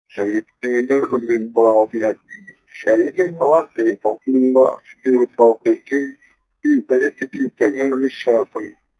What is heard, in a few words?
ui, button